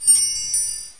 ring roll; The Lord of the Rings The Two Towers CD Cardz Rohirrim

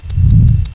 Whoosh; PC Gamer IT CD 35

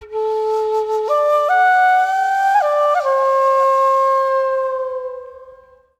flute, ethno; eJay

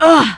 FALL; QUAKE2; female

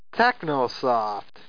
TECHNO

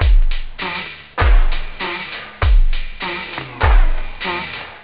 katang, instruments wave loop house

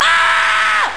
scream; Bip Boing & autres

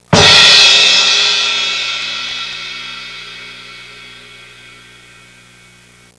cymbal crash, percussion instruments; Sound Effects for Multimedia - Volume 1

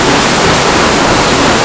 waterfall; NightFall

